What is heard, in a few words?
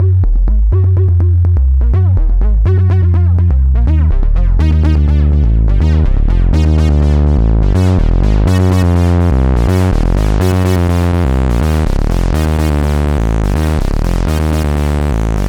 synth arp; Electrocution